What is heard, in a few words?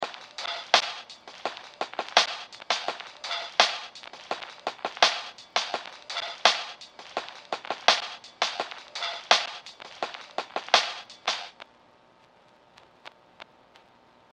Vinyl